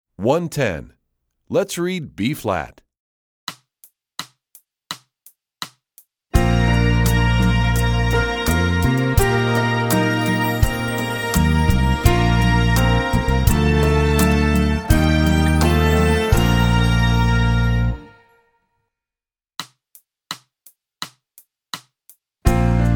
Let's Read B flat Review, strings; EE2000 Book2

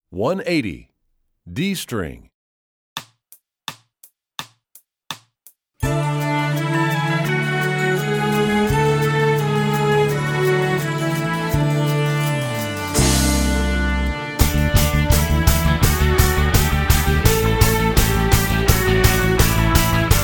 D String; EE2000 Book2

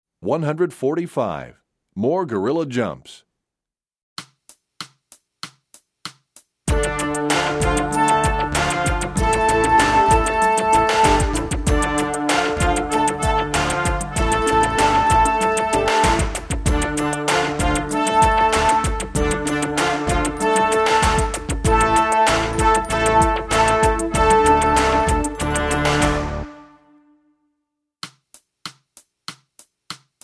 More Gorilla, Brass WW; EE2000 Book1

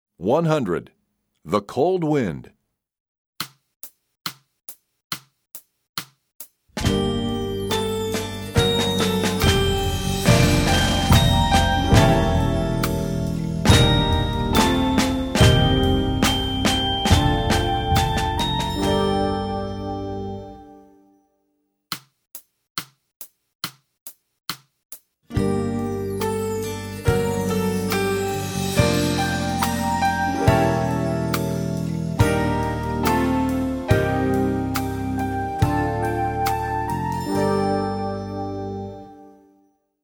Percussion; The Cold Wind; EE2000 Book1

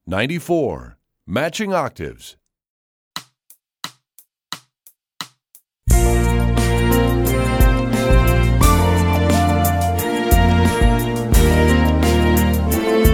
Matching Octaves, Strings; EE2000 Book2

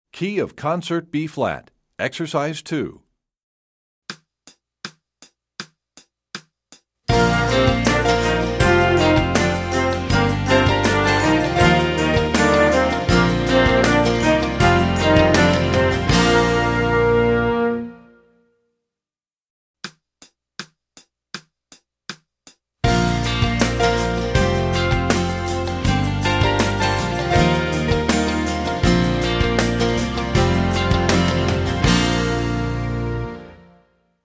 Concert B flat; EE2000 Book1

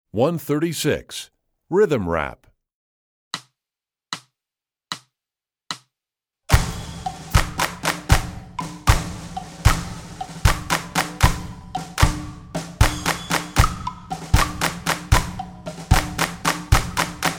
Rhythm Rap, Strings; EE2000 Book2

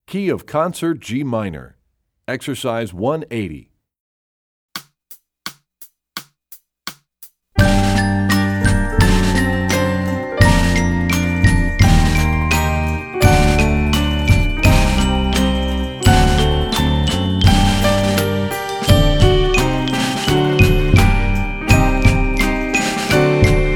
Studies Concert G Minor, Percussion; Rubank; EE2000 Book2

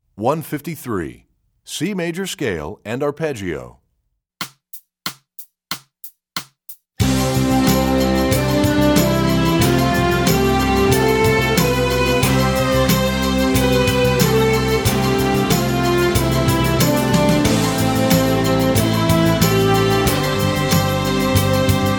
C Major Scale, strings; EE2000 Book1

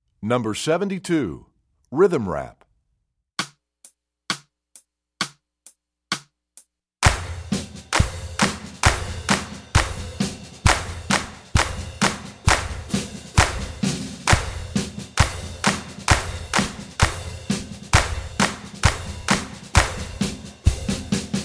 Rhythm Rap, Strings; EE2000 Book1

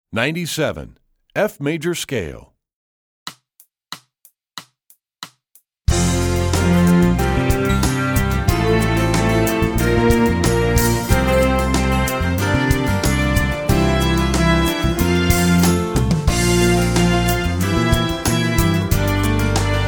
F Major Scale, Strings; EE2000 Book2

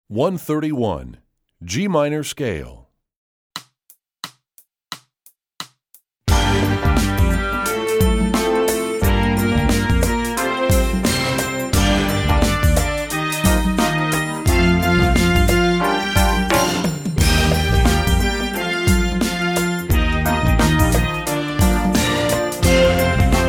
G Natural Minor Scale, Strings; EE2000 Book2

